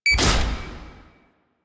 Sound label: Railjack Weapon Power Ready One; Modular Weapons